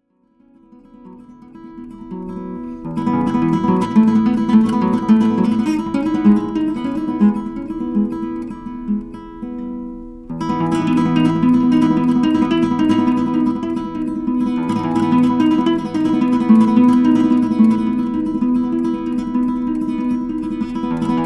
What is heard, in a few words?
Solo Classical Guitar